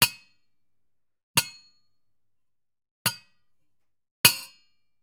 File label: Generic Click Metal Medium; boom library; The Interface